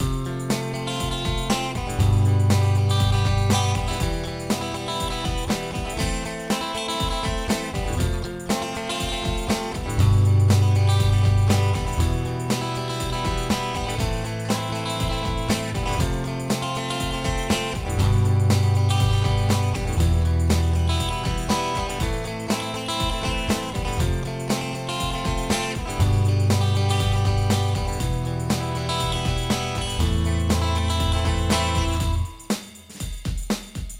arpeggio E acoustic

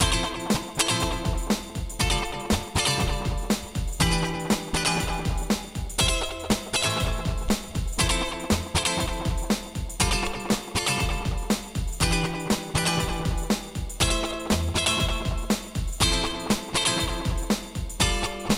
cutting E acoustic Delay Kind Of Dub

